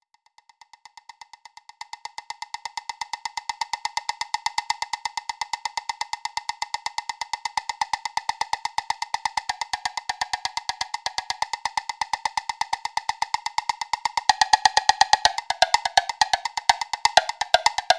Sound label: Percussion Version